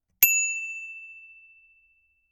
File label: Bicycle Bell